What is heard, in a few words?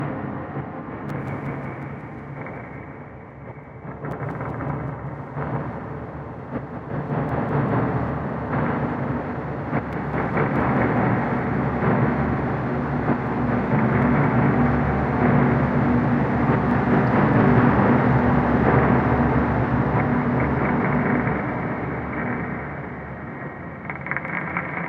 Ambient Mountains